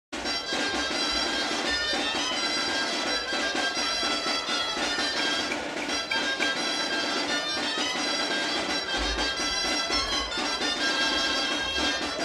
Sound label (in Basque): Porrusalda, Gaiteroak; Uharte Arakil